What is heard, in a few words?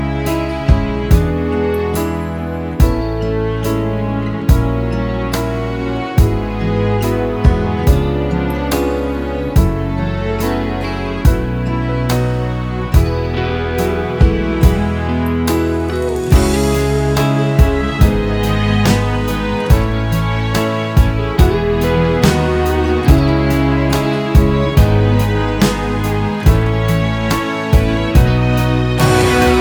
Instrumental